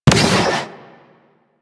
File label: Sentient Ghost Cannon Fire; Warframe; DE; Sentients; Sentient Eidolon Ghost Caster; Weapon